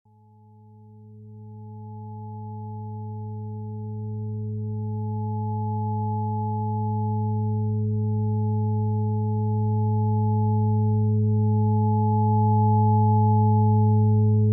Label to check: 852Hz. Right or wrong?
Right